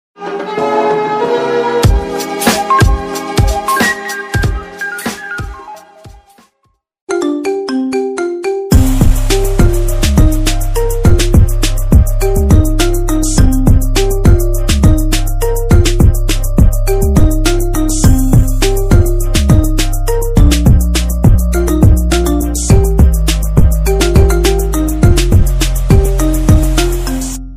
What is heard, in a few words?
marimba remix